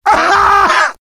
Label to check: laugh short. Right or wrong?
Right